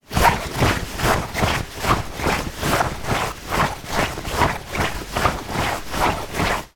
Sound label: denim jeans walking fast movements